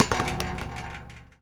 Street Ball Metal Back, Street Basketball

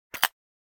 camera; NCH Library

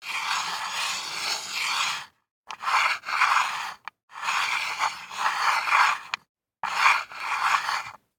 DICE on Wooden Tray, Slide, Standard, 2 Two Dice; Samples; ArticulatedSounds; DICE Sfx Party (Extended)

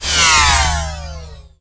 ship Lane Change; Space Wave Race

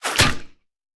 Bullet Impact; Sound Ideas; Guns

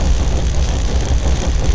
Sci-fi Electricity Hum Loop

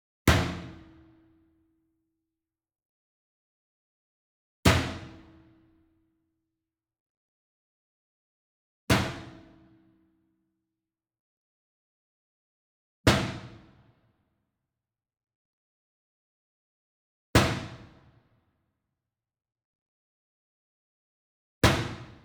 Sweetener Metal Bin Soft Hit Medium; boom library; Cinematic Strikes Construction Kit

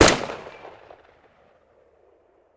Rifle; Sound Ideas; Guns

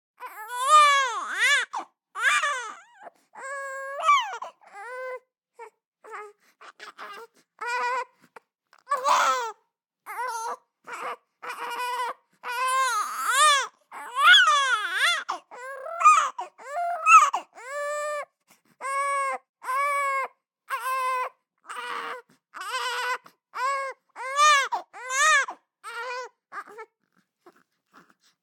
BABIES CRY Boy 3 months Dynamic; Baby Boom

